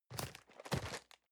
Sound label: cardboard box medium turn soft; objekte